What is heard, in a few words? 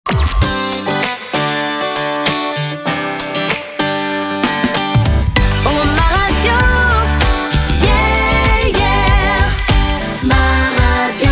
jingle; MA RADIO AC VOL.2